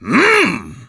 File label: Hmm Loud Grunt; "Hmm!" (grunting loudly); Sir Combsly